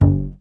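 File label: string contrabass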